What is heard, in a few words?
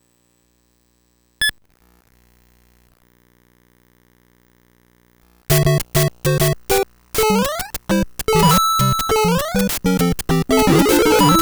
famicom cart level comparison